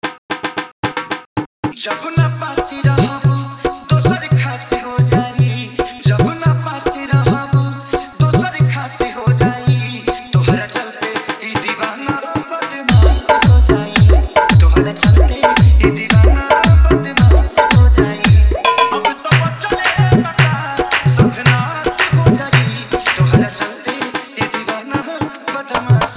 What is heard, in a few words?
New Bhojpuri Dj Remix Song